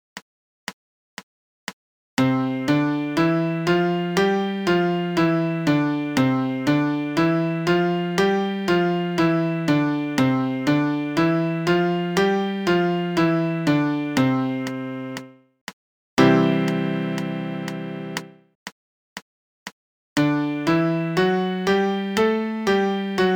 5 Finger Triad C D E F G A 120; Piano Practice Tracks

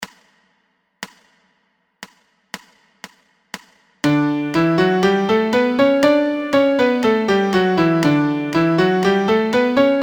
MIDI Major Scale D

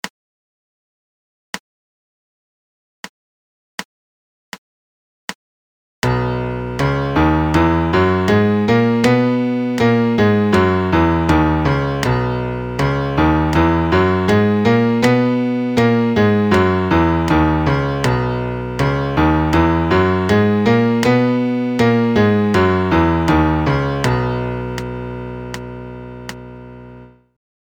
MIDI Major Scale B 80

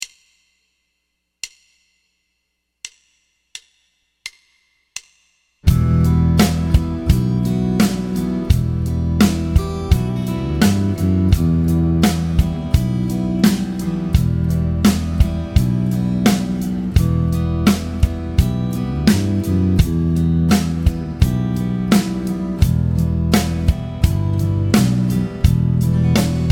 Triad Vamp MIN Em

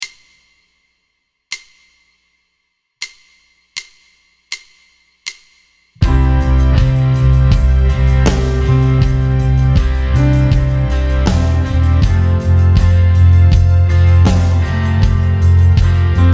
CFCG Uke 80

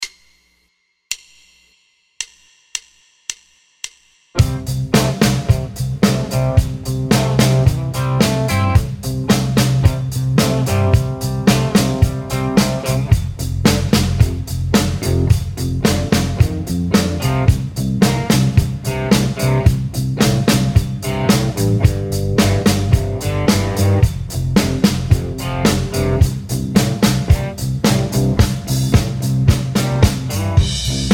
12 Bar Rock C 110 PNO; Blues Rock C 110; Piano Practice Tracks

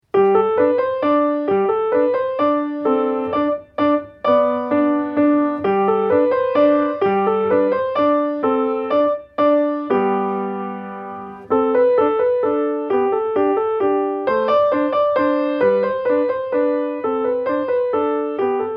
Petit Music Box

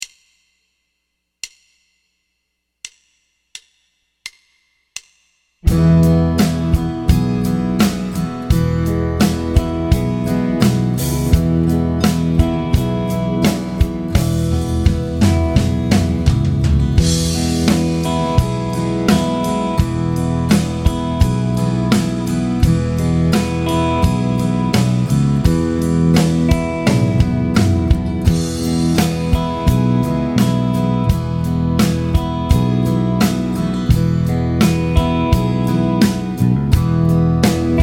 Triad Vamp MAJ E; Triad Vamp E Major; Piano Practice Tracks

